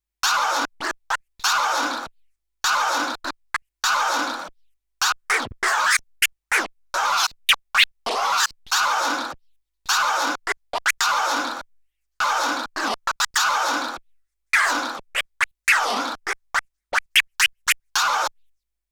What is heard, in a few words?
Skratch exercise